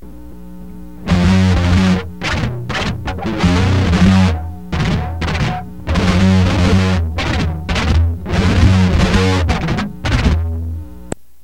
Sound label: guitar six